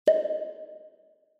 Onoma-Pop (Reverb)

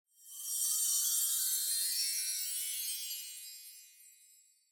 Onoma Sparkle Short

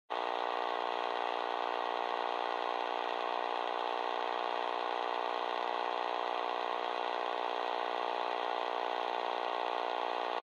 Radio Signal Noise AM

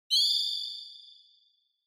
Flash High Long; オノマトペ 光る; 高 長

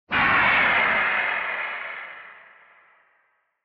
Retro Anime Gun High

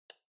Light Switch Box Rocker Dry Gentle

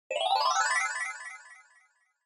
Scene Change Delay Fast Long

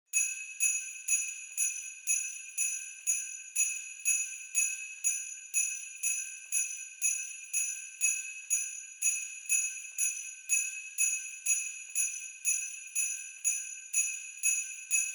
Sleigh Bells Knock Gentle

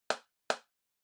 Tablet PC Tap Double

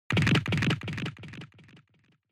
Fracture Delay

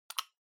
Pocket Watch Lid Open

